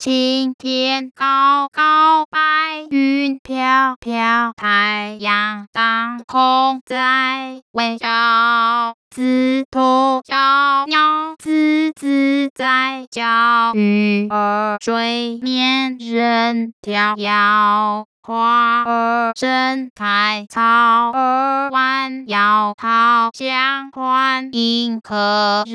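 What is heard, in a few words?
Synthetic singing voice (female), Pitch coartcltn, Chinese; 青 天 高 高 [白雲] 飄 飄, [太陽] 當 空 [在微] 笑; 枝 頭 [小鳥] 吱 吱 在 叫, [魚兒] [水面任] [迢遙]; Mandarin Singing Voice; Ode to Joy; (快樂頌); Pitch Coarticulation for Mandarin Singing Voice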